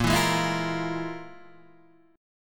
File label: F Minor Major 7th Double Flat 5th